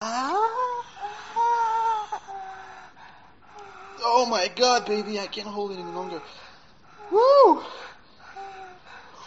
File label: aaaagggh i cant hold it any longer